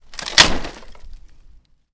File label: Smashing Wooden Fence